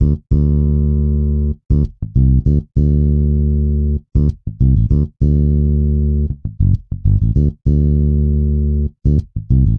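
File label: Bass R&B D major 98 bpm; Guitar and Bass; Ableton; Factory Packs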